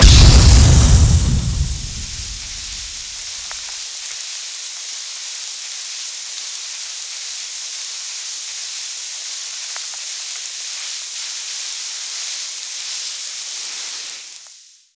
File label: grenade explosion